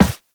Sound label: Run Metal, Footsteps; Warframe; Characters; Operator